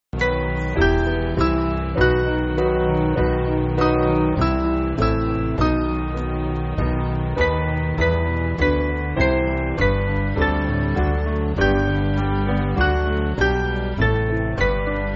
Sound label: Band, church music; O Darkest Woe; O Traurigkeit